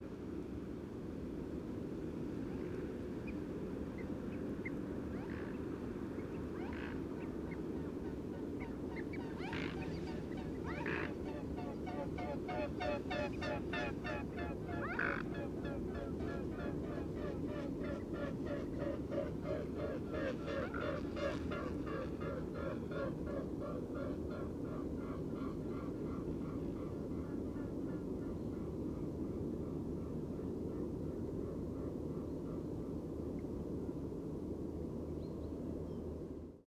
Wing Sounds With Doppler Effect

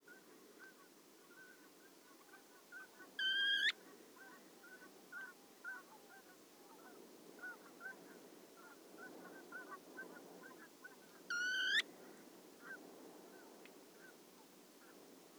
single note alarms

